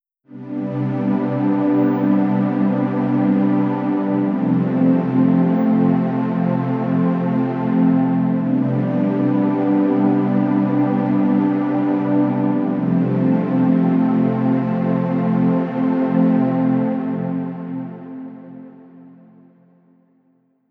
pad with fx

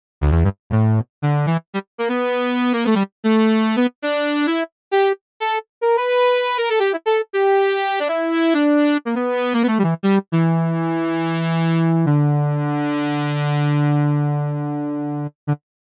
sub sample; ewi reason sounds

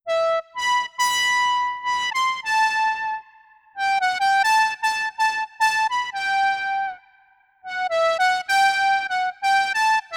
super m noisy lead

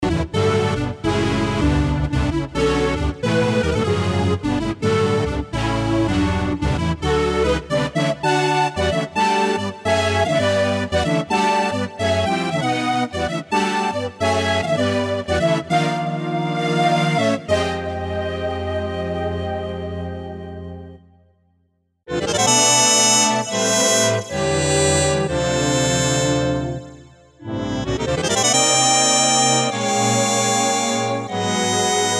synth hybrid series

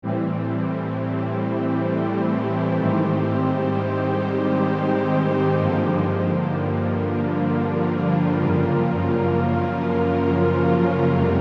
pad filt

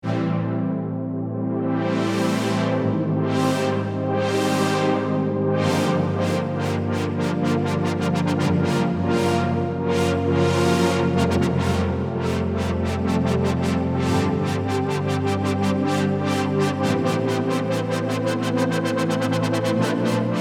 pad filt

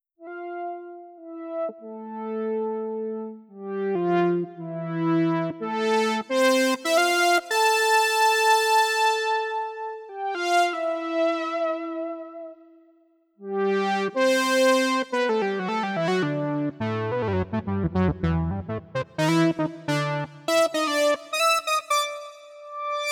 octave lead

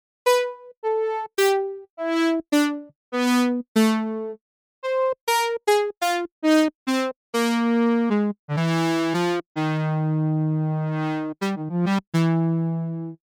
sub sample; ewi reason sounds

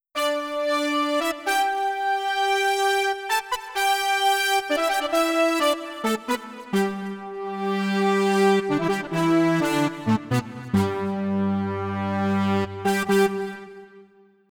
synthbrass

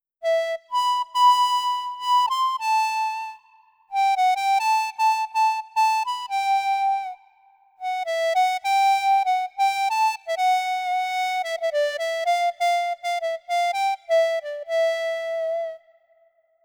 super ob airy clarinet

